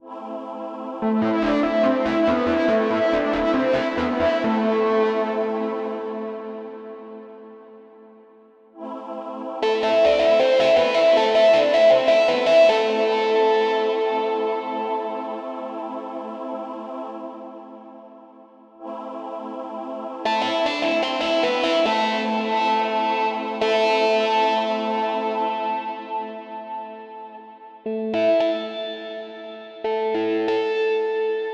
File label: distortion